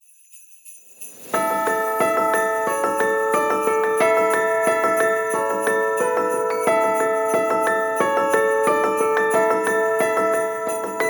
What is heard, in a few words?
Christmas Piano Inspiring generic music